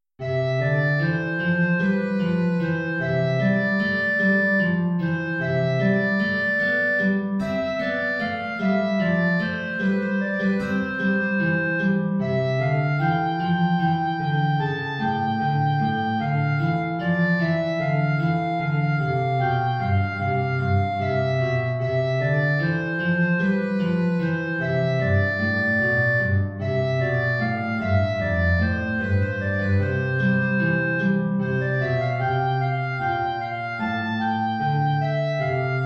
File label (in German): Arie Klarinette in Bb Gitarre hoch; BWV 212-4